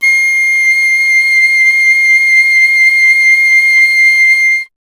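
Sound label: flute piccolo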